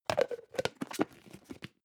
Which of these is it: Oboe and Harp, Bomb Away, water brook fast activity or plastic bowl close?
plastic bowl close